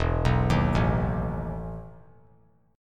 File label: E Major 7th Suspended 4th; arpeggiated; JGuitar